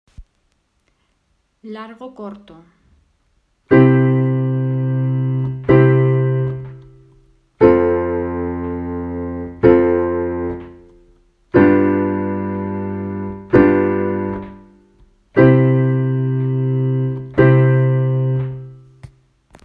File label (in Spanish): Sonidos Largos cortos